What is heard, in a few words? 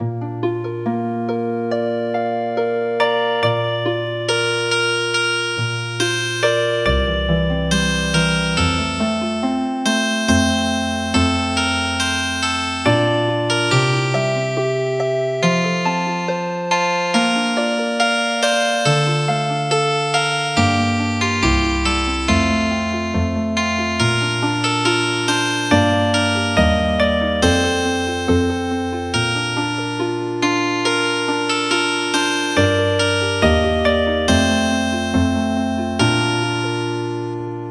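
Healing Harp, traditional; Du gamla du fria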